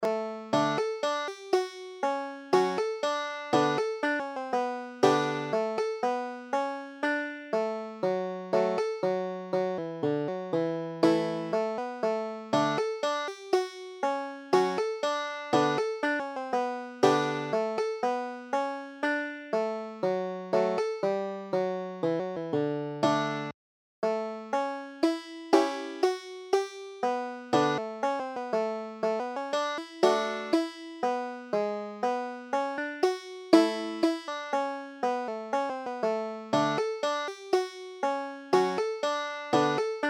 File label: blue violet waltz, banjo